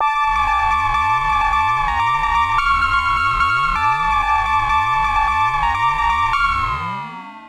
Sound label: lead; whisper